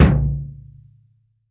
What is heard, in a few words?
BD, REAL DRUMS, JUNGLE KIT